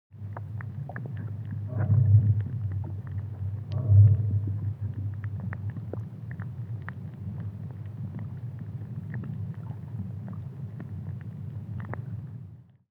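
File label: Underwater Recording Haven Atmo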